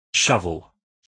shovel

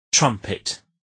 trumpet

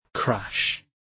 crash

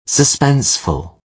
suspenseful

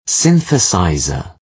synthesizer

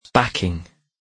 backing

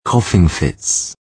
coughing fits

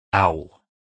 owl

